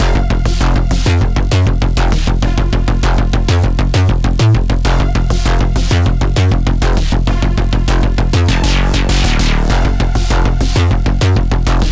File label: 99 BPM F Min, Melody Loops; Cyber Collection; Vengeance